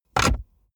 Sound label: car bmw e46 interior drink holder insert bottle hard